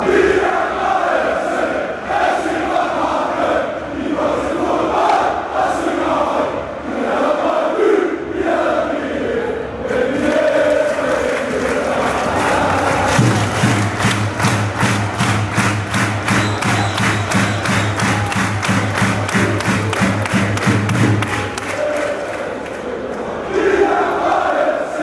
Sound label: fc copenhagen chant